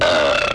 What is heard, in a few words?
burp